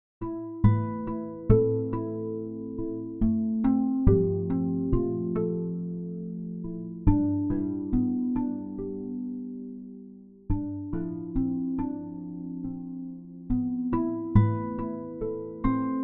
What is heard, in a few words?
papier train guitar